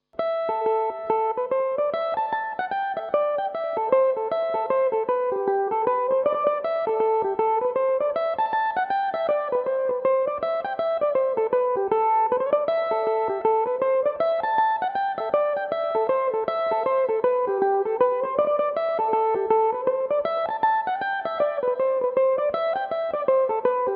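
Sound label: reel A dorian, irish mandolin, tunes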